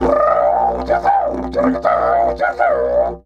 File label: B3 WILD, Didgeridoo; Big Fish Audio - Didgeridoo and Other Primitive Instruments